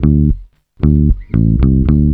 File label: bass, Roots Funk; USB Soundscan vol.41; AKAI